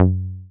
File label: SYN 303, Roland TB-303